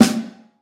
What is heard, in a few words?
low steel snare drum A key; snare-drum-samples